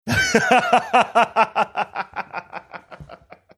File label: laughing